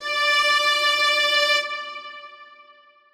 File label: Strings kiai